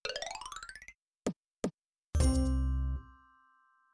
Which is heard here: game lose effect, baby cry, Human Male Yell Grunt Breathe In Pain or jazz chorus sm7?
game lose effect